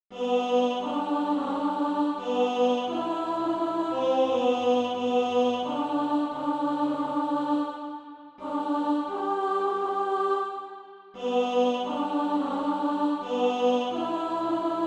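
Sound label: alto, Sheet music (SATB) including Practise Music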